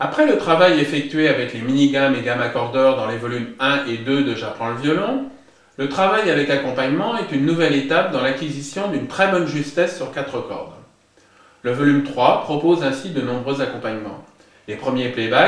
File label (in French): Jeu avec playbacks